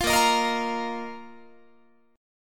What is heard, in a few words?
A sharp Suspended 4th Guitar; A#sus4 strummed; 20,18,18,20,21,21